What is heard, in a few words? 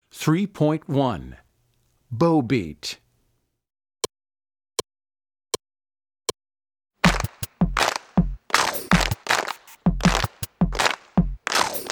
Bow Beat